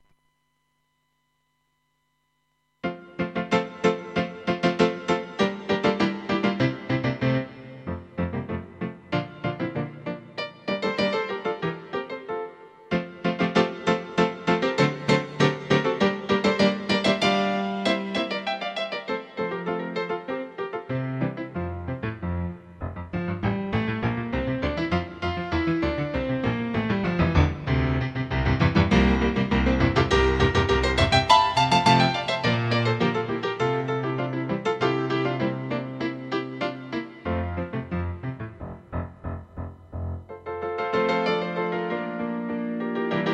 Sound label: PIANO